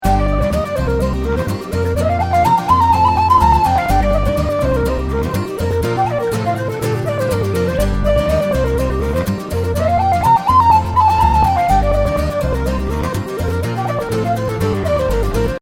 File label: loop mono